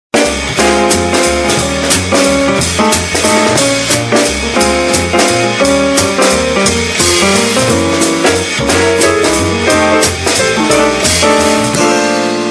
ring jazz, phone; HP iPAQ Glisten

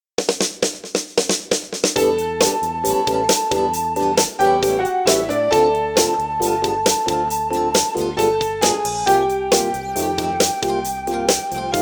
Bicycle, ringtones; Samsung; Galaxy A SHW-M100S

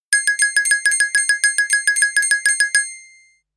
Timer, phonetones Nokia; Nokia E7

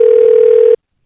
Do Ring; HTC S740

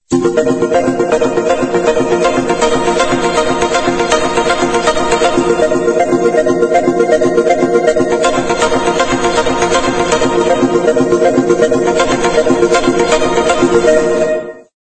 Ringtone

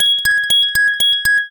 Ding, ringtones; Motorola MOTOLUXE-XT615

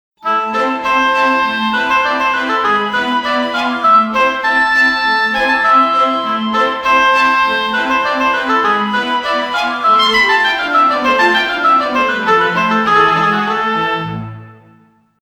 Baroque, ringtones

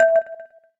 electric zero, keytone; SKY IM-A760S-VEGA-Racer

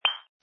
Volume Ring Tone; Bluboo Xfire-5.1

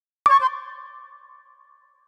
Fluting, notifications; Motorola Moto-G20; lenovo

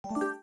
key menu select, keytone; Samsung SGH-T369 Linx

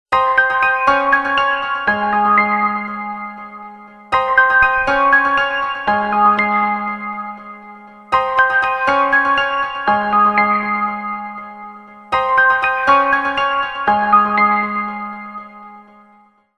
Ring; HTC Touch Diamond

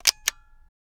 Old Camera; Samsung Galaxy S22; Retro

